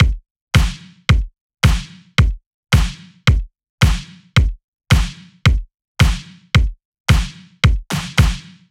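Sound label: Nu Disco Kit 110bpm Stripped, Drums; Drum Kits; Stems; Ghosthack; Ultimate EDM Drums; Nu Disco Kit 09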